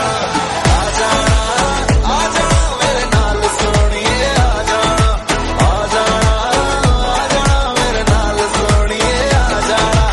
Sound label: punjabi song tone ringtone